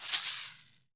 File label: caxixi; AKAI S-Series CD-ROM Sound Library VOL-5